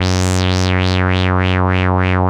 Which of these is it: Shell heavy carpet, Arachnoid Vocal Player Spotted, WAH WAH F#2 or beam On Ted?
WAH WAH F#2